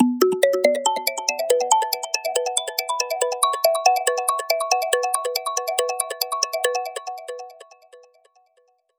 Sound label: fake mbira loop BPM140 B; lead arp chord melody